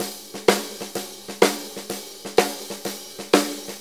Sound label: Blues Loop, Drum Tools, Full Kit Loops